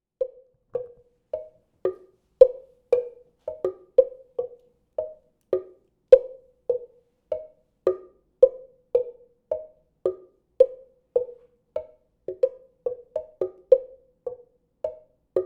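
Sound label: Prehispanic Percussion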